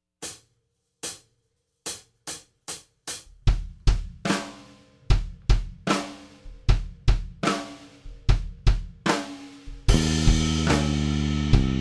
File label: Power Rock Rehearsal Track